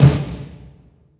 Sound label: LO AMB TOM, ACOUST TOMS; Greytsounds